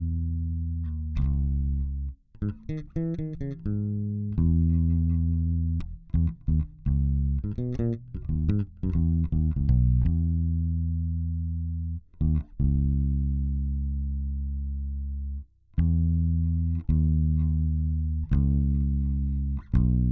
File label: Bass